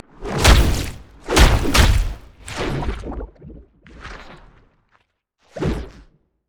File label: emote laugh; Zac